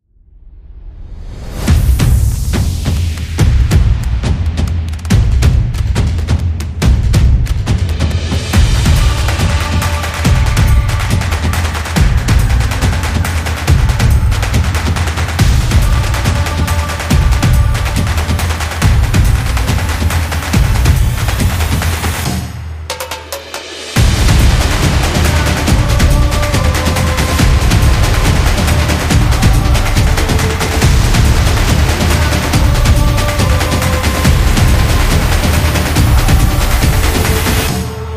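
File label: Stadium Intro, sport and action music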